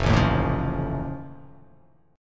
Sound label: F Augmented 7th F, chord